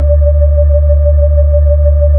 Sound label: B-3, Hollow